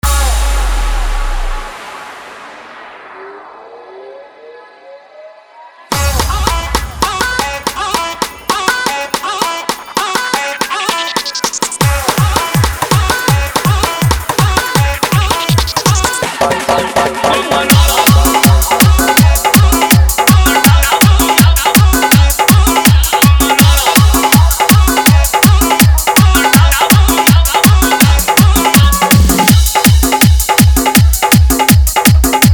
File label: New Bhojpuri Full Dhollki Bass